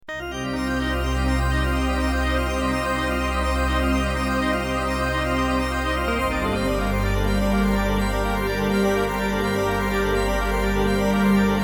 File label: ws layer